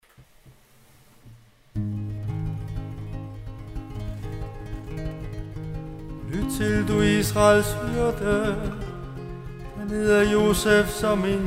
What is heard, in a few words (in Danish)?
tone gregoriansk